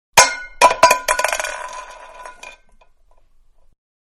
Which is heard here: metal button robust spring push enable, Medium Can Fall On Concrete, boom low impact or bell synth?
Medium Can Fall On Concrete